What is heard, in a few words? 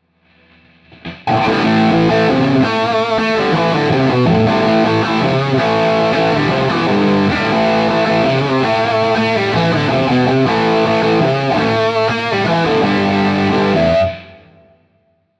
no phaser